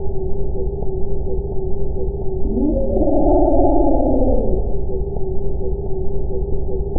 churr, Empidonax traillii; Passeriformes; Tyrannidae

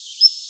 song

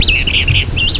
complex, Empidonax alnorum; Passeriformes; Tyrannidae

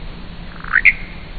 creet, Empidonax traillii; Passeriformes; Tyrannidae

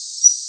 wefl or song1; Passeriformes; Tyrannidae; Empidonax difficilis

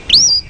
dsong2, Empidonax difficilis; Passeriformes; Tyrannidae

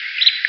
song, Empidonax difficilis; Passeriformes; Tyrannidae

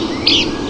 weeoo, Empidonax traillii; Passeriformes; Tyrannidae

